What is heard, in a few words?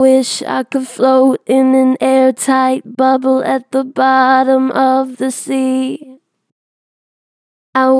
Vib Wish I could float, Vocals, Natural Vibrato Strong; Harmor Vocals